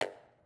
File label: start drop; draw pixels